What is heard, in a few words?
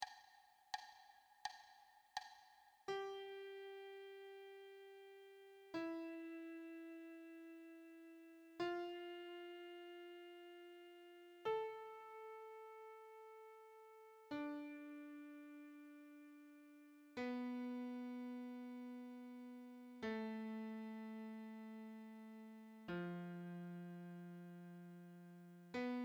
C whole notes solo